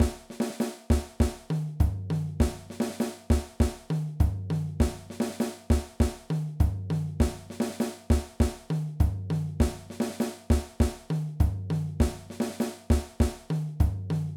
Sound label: Afro Cuban